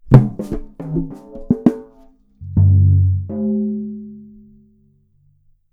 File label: short phrase